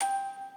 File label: musicbox